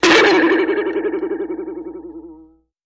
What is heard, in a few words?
Boing Box Sharp Vib